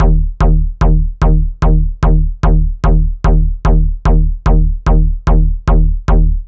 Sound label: Solid Bass; Dr. Fink's Funk Factory